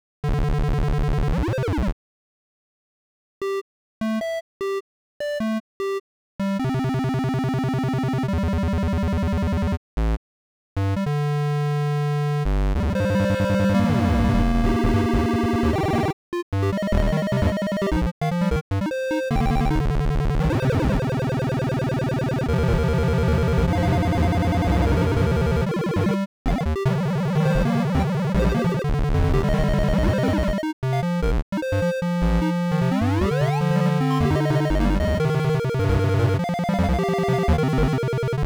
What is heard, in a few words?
beep song